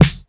old school sharp hip hop steel snare drum sample C sharp key